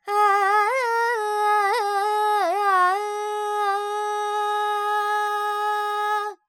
Major, Carnatic Female, Alaps, Sankarabharanam, Dry; Vocals; Sample Banks